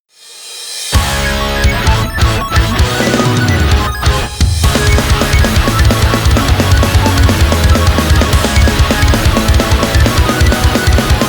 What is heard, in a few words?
off vocal